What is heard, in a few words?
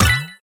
collect; pinball